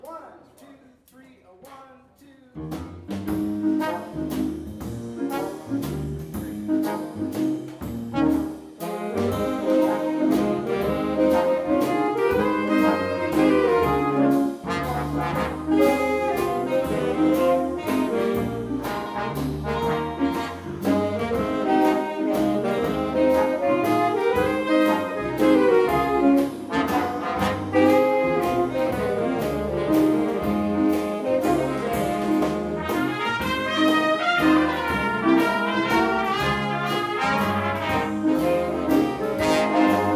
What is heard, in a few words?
Waltz